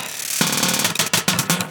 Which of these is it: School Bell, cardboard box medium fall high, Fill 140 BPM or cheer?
Fill 140 BPM